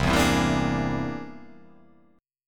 C Augmented 9th

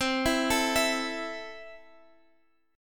C 6th C down Guitar Standard; C6 arpeggiated; capo=3

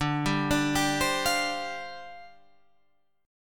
C Suspended 2nd Suspended 4th, D

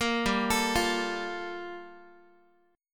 G Minor 9th, Guitar; Gm9; arpeggiated; 13,15,12,15,x,x

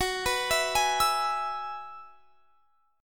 F sharp 9th Suspended 4th F sharp, chord; F#9sus4 Chord; arpeggiated

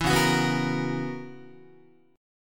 Db Minor 13th Db Guitar; Dbm13 strummed; 7,9,9,9,10,9; JGuitar

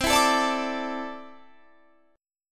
D 7th Suspended 2nd, C

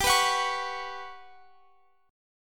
G Major Sharp 11th G down Guitar Open F; Listen to GM#11 strummed; GM#11 Chord

